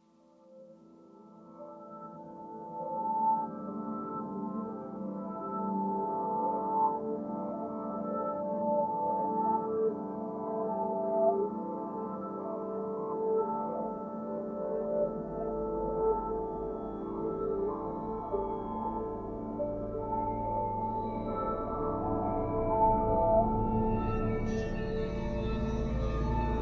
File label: Slight Tension